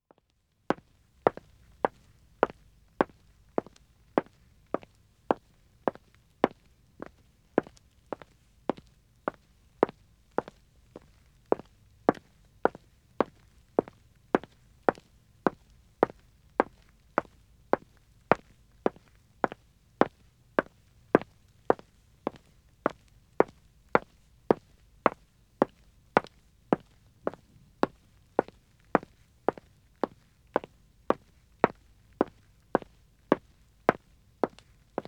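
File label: Wood (Dead Acoustic) Walking man, Footsteps; BBC Sound Effects Library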